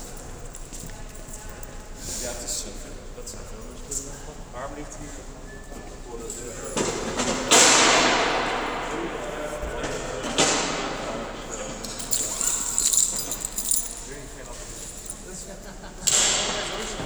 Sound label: foley